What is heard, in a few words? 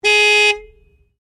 Horn Long Exterior, car; Hyundai Accent 2008; Hyundai hire car; Vehicles